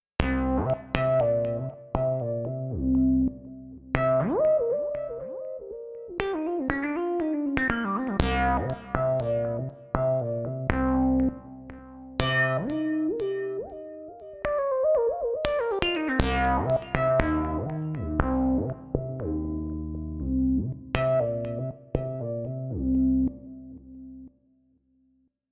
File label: v synth xt jp 8000 solo